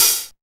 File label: clicky hi hat f key